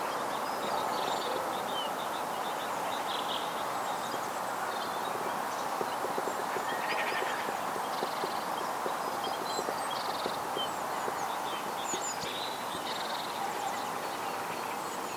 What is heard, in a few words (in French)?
bouvreuil pivoine; haute ile